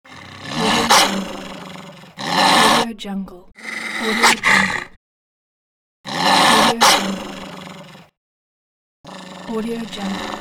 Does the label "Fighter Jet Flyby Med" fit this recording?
No